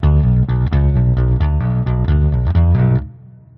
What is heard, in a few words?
bling riff